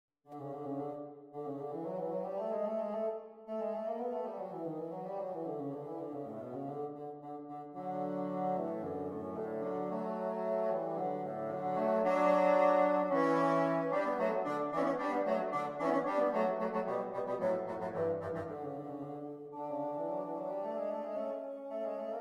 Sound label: The Marriage of Figaro bassoon duet; overture